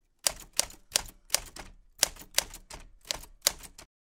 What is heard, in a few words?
Typewriter vintage typing short